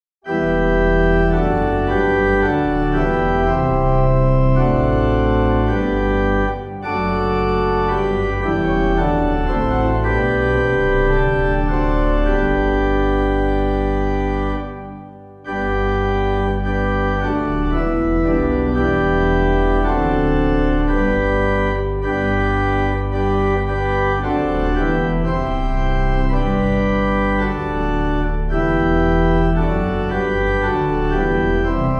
The Saints Who Toiled from Place to Place, OLD 100TH, organ, hymn